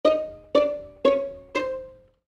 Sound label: String Plucks, String Plucks Descend; Cartoon Music; Blastwave